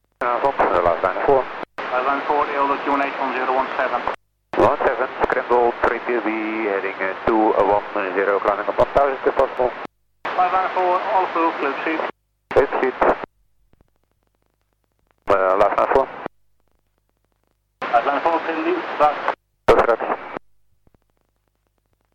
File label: scramble; to frederiksoord